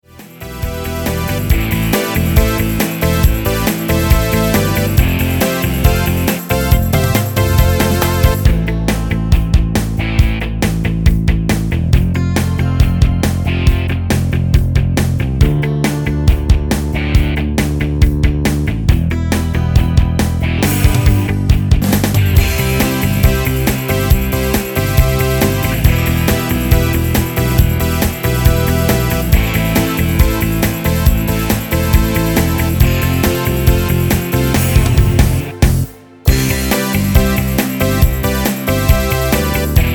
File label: Rock